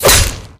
golf club melee; Left 4 Dead 2

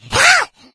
become alert, male, infected

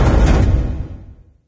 garage stop, doors; Garry's Mod; hl2

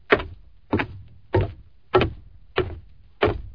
footsteps wood, materials; Garry's Mod; hl2

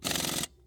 tool; AssaultCube; Alien Stars; American Muscle Series

